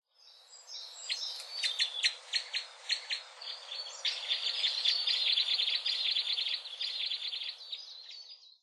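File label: Red Crossbill, Osado; isuka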